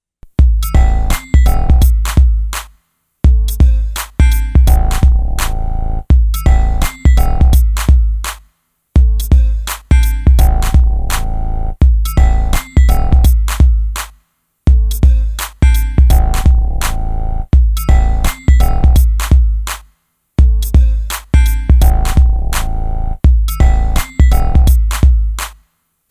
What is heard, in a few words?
Rap Creep Out, Urban and Rap